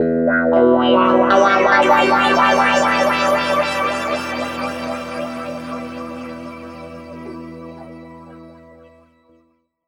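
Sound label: E pitch shift wah, Guitar Samples; East West; Steve Stevens Guitar Samples Collection; PTCH-SHFT+WA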